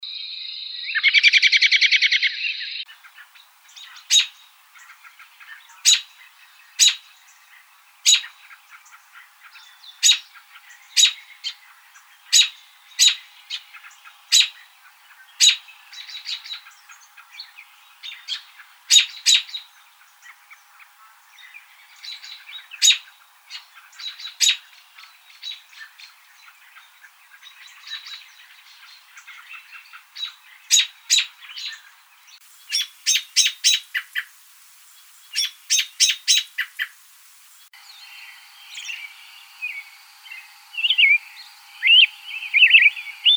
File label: American Robin